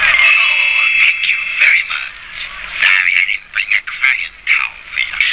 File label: crying